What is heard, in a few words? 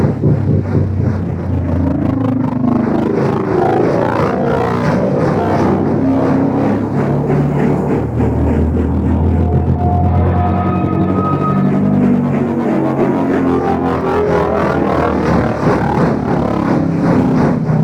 Crew Ship Rotation Speed Loop, Engines; Warframe; Vehicles; RailJack; Crew Ships; Grineer Crew Ship